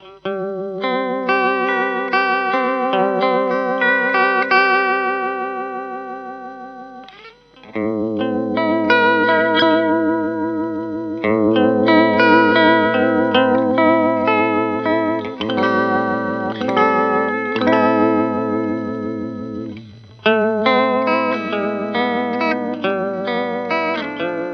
Dunlop Authentic Univibe

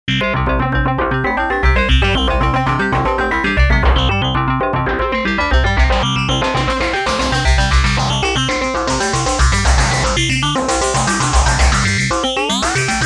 Sequential Pro 2 Paraphonic FM Sequence

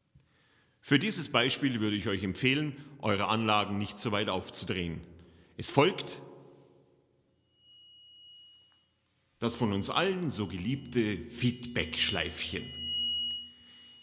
feedback

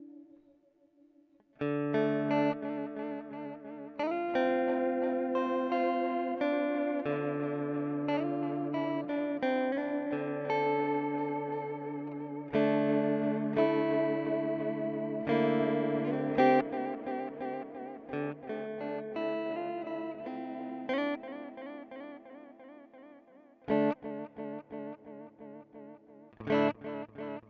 Meris Polymoon Early Modulation

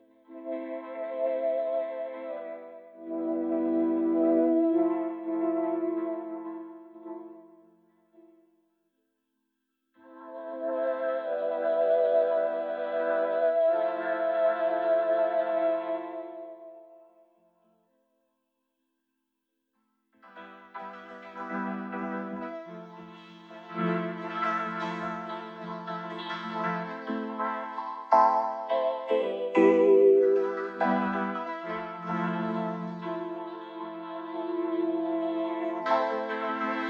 vocoder

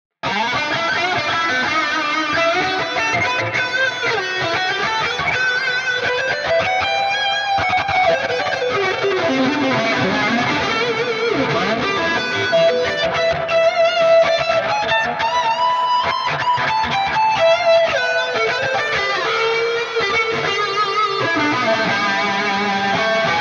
Liquid Lead; Archetype Petrucci

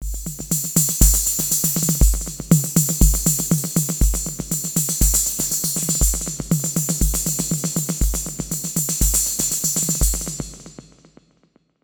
Echo Tape Reverb Space; Ableton

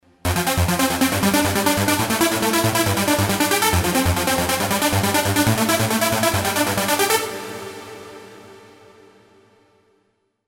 Super Saw Seq SH-201

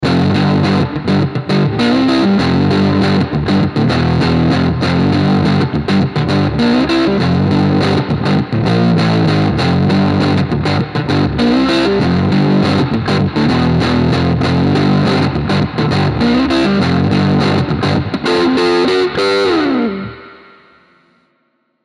ESP Phoenix 401 Neck OD

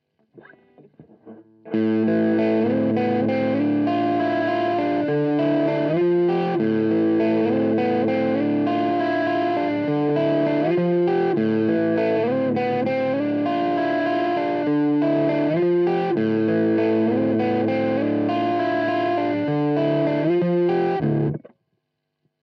mic close

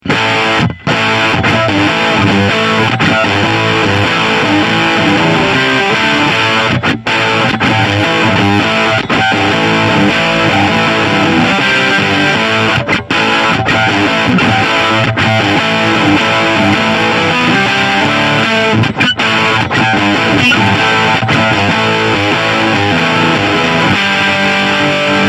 Tweak Fuzz

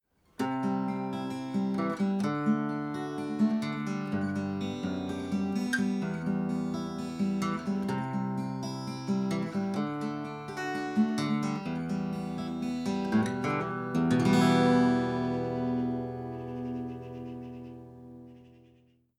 Epiphone EJ-200 SCE VS Fingerpicking

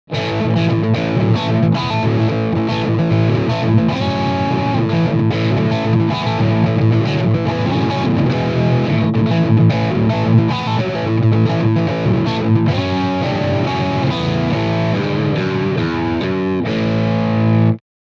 Riff Mid Distortion

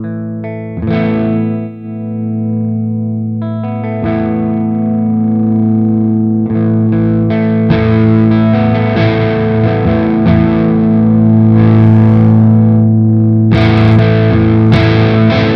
Fender Volume poti; Fender vs Harley Benton

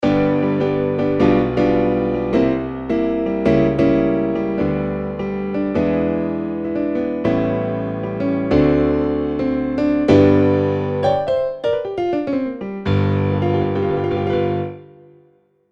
Piano

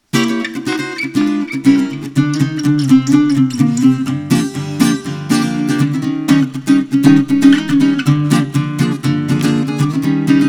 Mikrophon slapback dynamic mic

